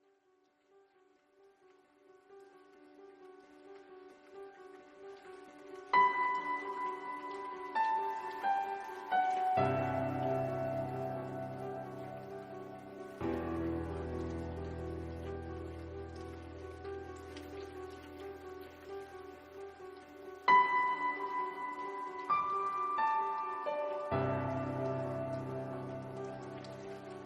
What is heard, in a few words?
Ambient